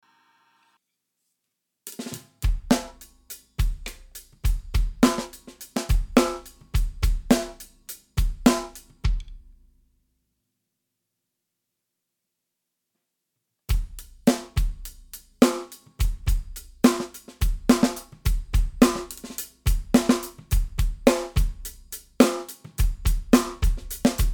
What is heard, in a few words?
cherry snare